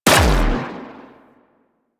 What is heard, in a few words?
Briefcase Akimbo Pistol Alt Shot; Warframe; Weapons; Corpus